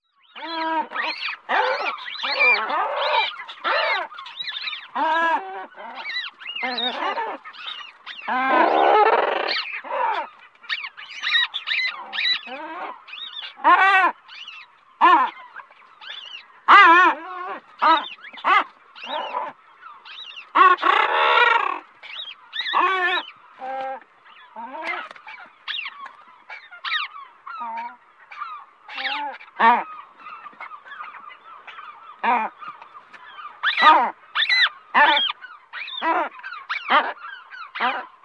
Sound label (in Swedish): Sillgrissla